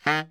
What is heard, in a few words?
BARI FF D#2, saxophones; Greytsounds - Philip Wolfe David Crozier's Saxophones; BARI SHORTS